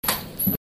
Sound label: loop